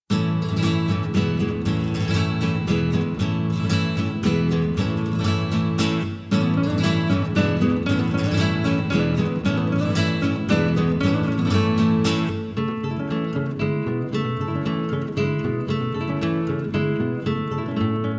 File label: Amazing Chill Guitar; CHILL OUT SUAVE